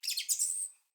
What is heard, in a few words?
Bird Song; Josalfar Bird Guide